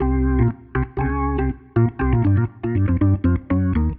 jazz keys Organ 120 B-line n Riff C

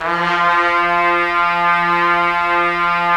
Trumpets; Roland - LA Composer vol. 4

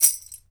BEL RATTL, African Drum Percussion; E-MU Producer Series Vol. 7 – Old World Instruments

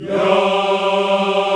VOX, African Yas; Roland LCDP11 Africa VOL-1

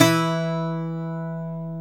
SAZ E 2; Soundscan vol.53; Mediteranean Traditions; AKAI

